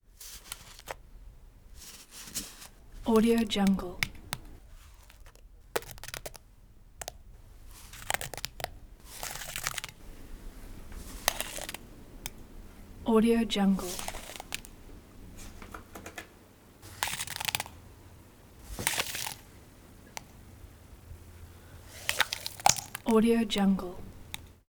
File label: milkshake pour into ceramic plate plops